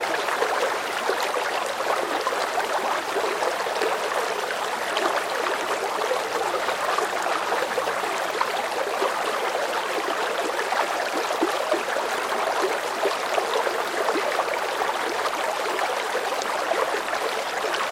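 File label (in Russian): непрерывное журчание большого мощного ручья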